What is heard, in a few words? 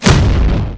melee; gladiator